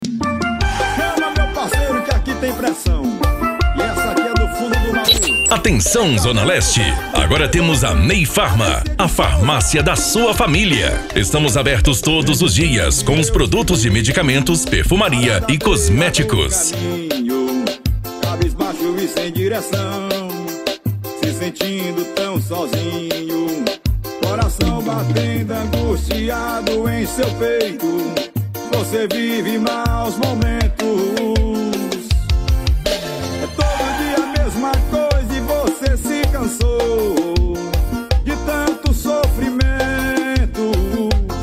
gospel

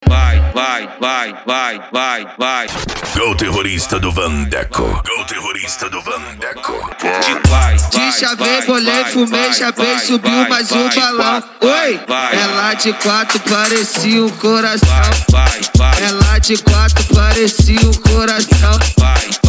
dance e funk mega bass

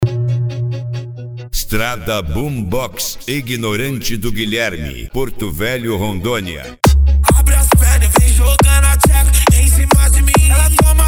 esp funk atack

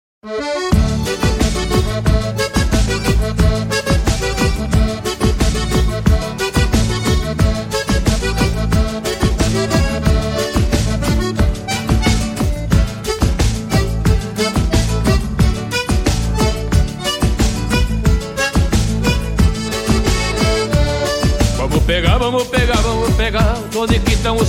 batidão gaúcho sem vinheta

